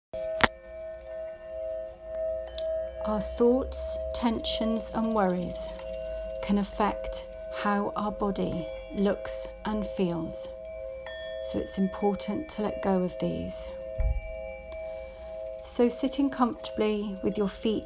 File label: Meditation with bells and rattles